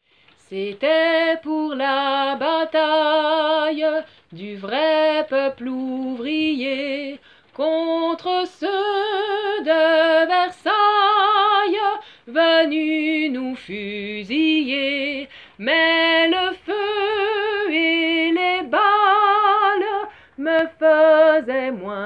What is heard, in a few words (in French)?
voix haute; J'attends devant ma porte